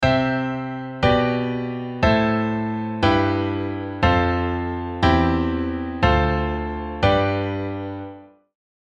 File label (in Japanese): カノン進行 ベース降下